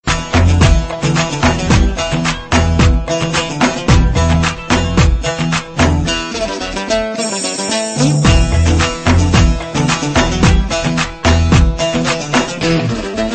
Gitar Tune

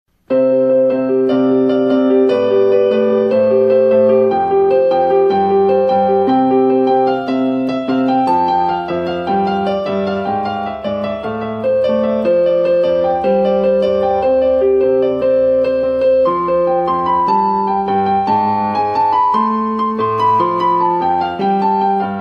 Piano improvisation

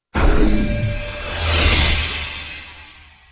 Teleportation; Asgard

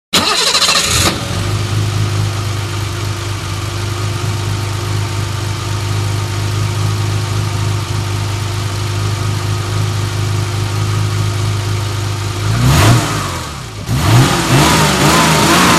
BMW 528i

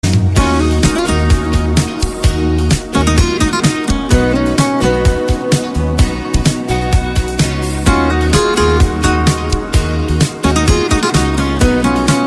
Persian Guitar

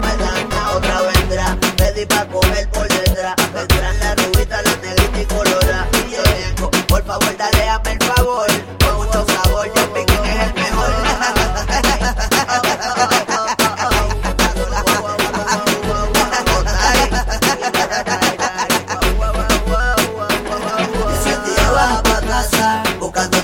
Reeggeton

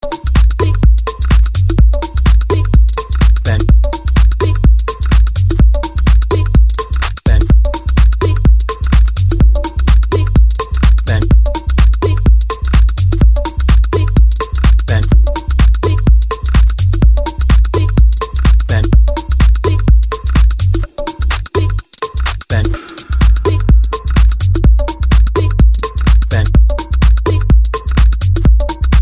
Minimal

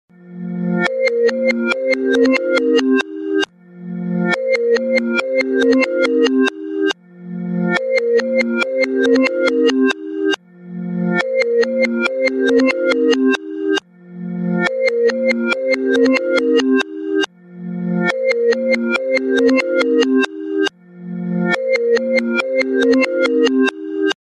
Reverse iPhone ring

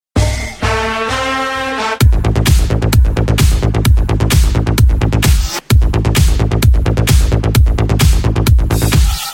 Samba Sms

